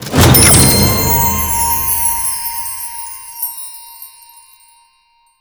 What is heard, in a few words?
UI Menu Purchase MagicItem